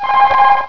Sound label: server message